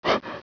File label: pant, dogz